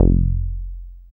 Bass SH-101

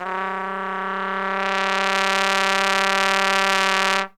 T G LNG, TRUMPET FX; Zero-G; Phantom Horns

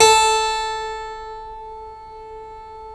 A 3 CLAV, Keyboards Acoustic; AKAI; USB Soundscan vol.17

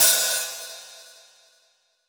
Jazz Open HiHat

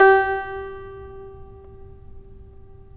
TAR; Roland - LA Composer vol. 3

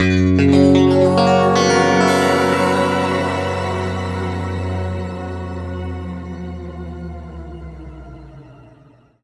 F# P-S, guitar chords; East West; Steve Stevens Guitar Samples Collection

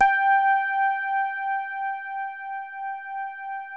DX Rhodes; Best Service Advanced Media Trax Modern Composer

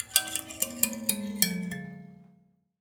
Finger Gesture, Finger Prepared Kit

